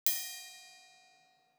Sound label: ambient